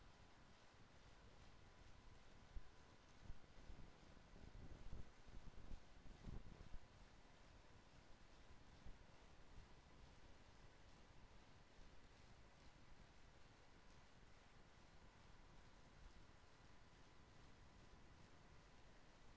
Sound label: HYDROPHONE 16000Hz; JASCO AMAR HYDROPHONE 740